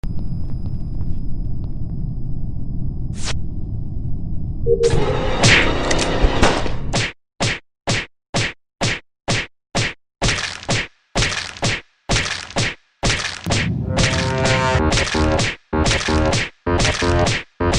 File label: Rap